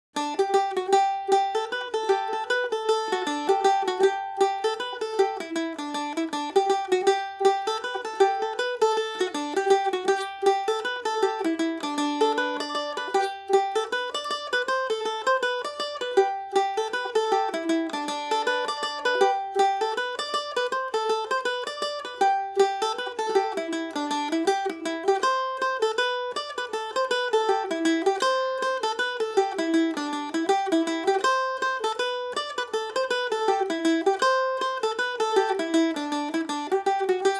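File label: G major falder, irish mandolin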